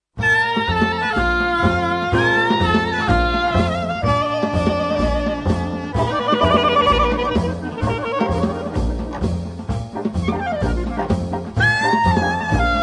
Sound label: Swingin The Samba